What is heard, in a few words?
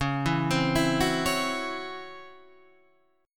C sharp Minor Major 7th Double Flat 5th